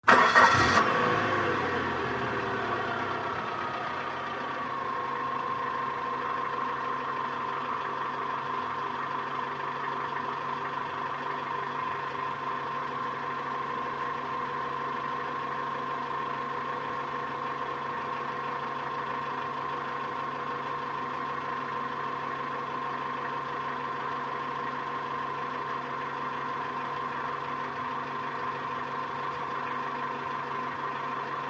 Sm Car Eng Idle Off, compact

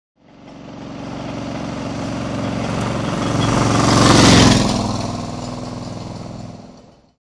By Old Motorbike Up, vintage

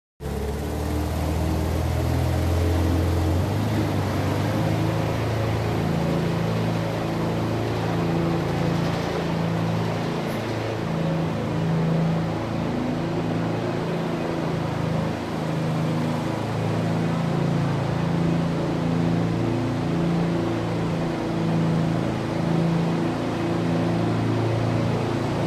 Ice Skating Zamboni

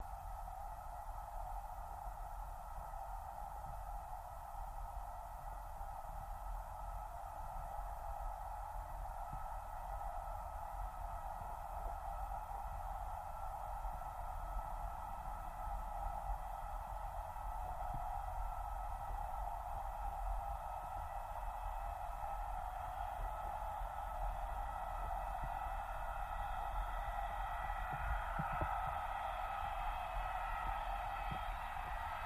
Torpedo Jet By, torpedos

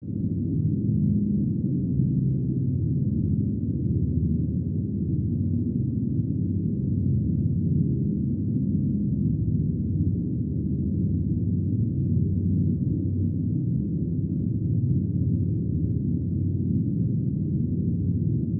Ambiance Bass Whirr, drones & tones

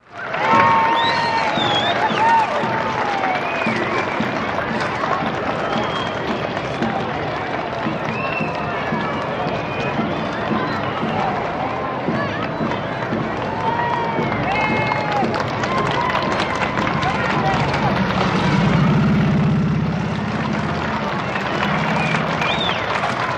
Crowd Parade Ext Med

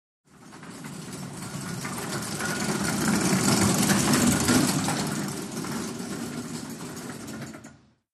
Gurney Cart Pass Fast, gurneys

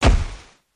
Camera flash, flash pot, good poof; cameras

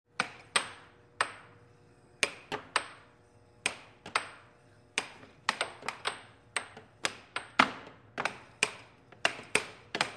Air Hockey Puck Slide

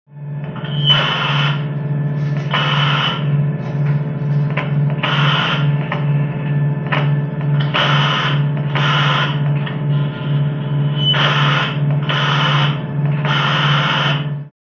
Radio ( Army ); Crackle; Army Radio Crackle. US; US Navy air to ground radio, possible soviet sub

